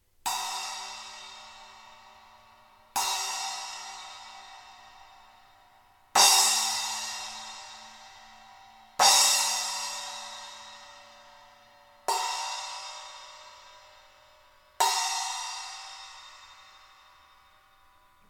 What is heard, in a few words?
Classic Splash 12; splash bekken; Amedia